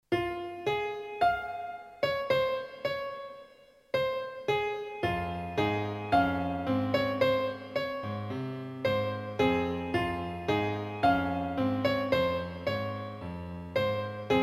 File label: piano